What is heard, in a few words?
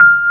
EPF F 5; AKAI S-Series CD-ROM Sound Library VOL-2